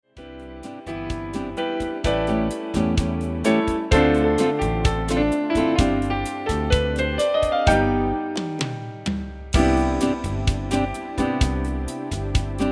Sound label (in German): Stille Nacht Bossa C